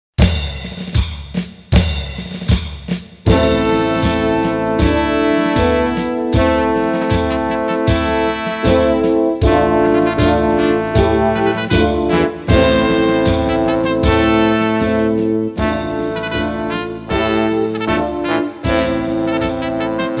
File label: Fanfare Etude, piano